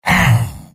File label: m pain sharp; soldier; tf2; garrysmod; mvm